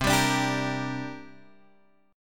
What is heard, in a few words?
C 7th Suspended 2nd, Guitar, Open G